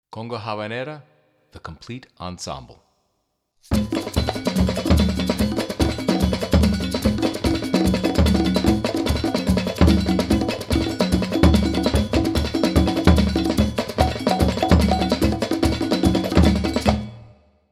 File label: conga